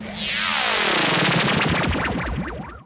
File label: Downlifter